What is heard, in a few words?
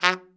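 BRA TRUMP, trumpet; Best Service Gigapack 1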